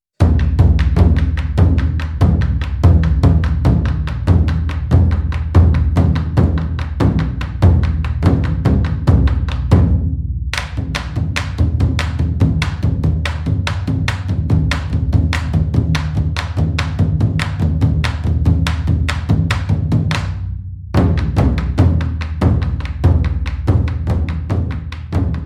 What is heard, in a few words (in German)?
Zwiefacher; Zwoaraloa